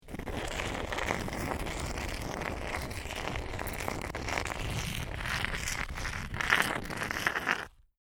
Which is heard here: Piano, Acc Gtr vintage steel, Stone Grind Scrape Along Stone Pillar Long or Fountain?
Stone Grind Scrape Along Stone Pillar Long